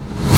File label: DOOR REV; AKAI S-Series CD-ROM Sound Library VOL-1